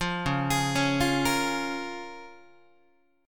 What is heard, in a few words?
C sharp 6th C sharp Guitar; arpeggiated; C#6; JGuitar